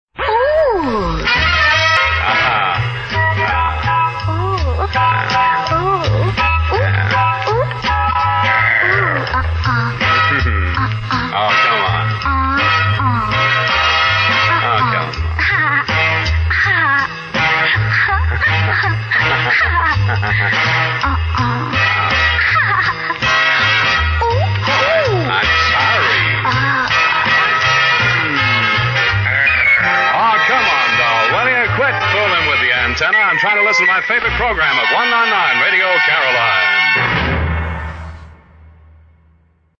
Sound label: ooh